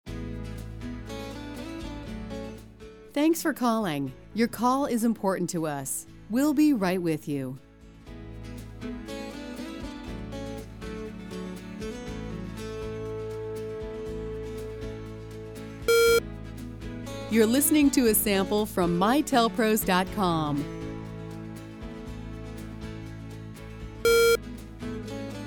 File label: Acoustic Pop Sample